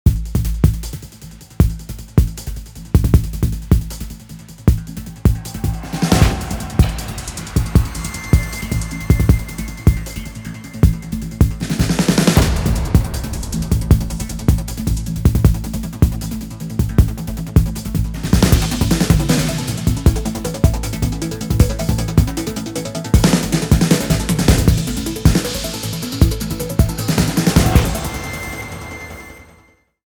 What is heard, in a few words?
SERIOUS FUSION, impact percussion